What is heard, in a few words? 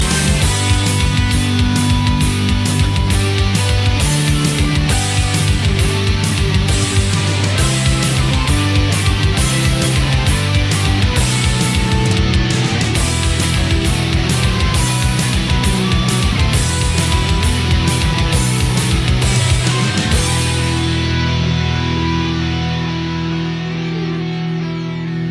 metal